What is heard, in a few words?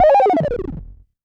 Sci fi